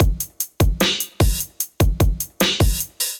Kit groove 150 bpm, Drums; MIDI Clips; Kingsway; Ableton; Factory Packs; Chop and Swing

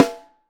SN Top, Snare; Ableton Foundation FreePacks; Snare Designer

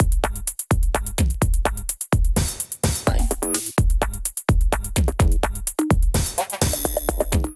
Glitch Kit groove 127 bpm; MIDI Clips; 122 - 130 bpm; Maker Glitch Kit; Ableton; Factory Packs; Glitch and Wash

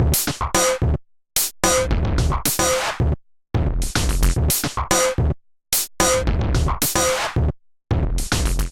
Kit groove 110 bpm, Drum; MIDI Clips; Abuse; Ableton; Drum Essentials

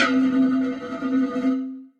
Scratchy Bowls, Percussive

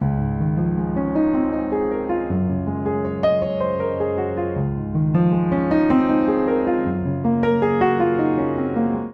Phrase I-IV Db Major 105 bpm, Upright Piano; MIDI Clips; Sycamore Tree; Upright Piano by Spitfire Audio; Ableton; Factory Packs

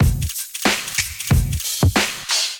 Kit 92 bpm, Drums; MIDI Clips; Bang Ya Head; Ableton; Factory Packs; Chop and Swing

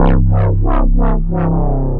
Wobble Bass Pulse; Instruments; Brooding Bass; Ableton; Mood Reel

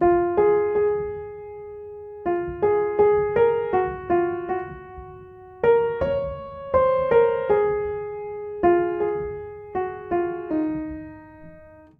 Phrase Db Major 80 bpm, Upright Piano; Emma; Upright Piano by Spitfire Audio; Ableton; Factory Packs

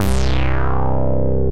Blast Bass; Synth; Pass

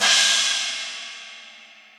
China Reso Classic; Drums; Drum Hits; Standard Hits; Cymbal; Ableton; Factory Packs; Session Drums Club

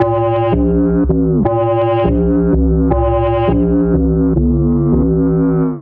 Bass F# Minor 165 bpm; MIDI Clips; War Dub; Ableton; Factory Packs; Skitter and Step

